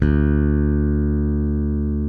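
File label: SB D1 SOFT, SPECTOR BASS; Northstar - New Gold VOL-1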